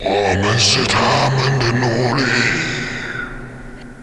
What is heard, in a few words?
zombi coming